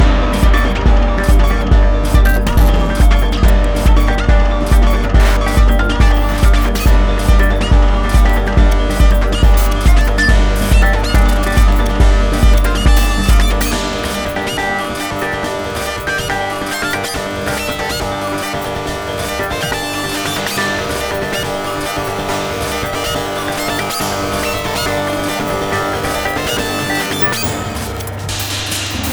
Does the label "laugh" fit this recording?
No